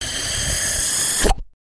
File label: Suction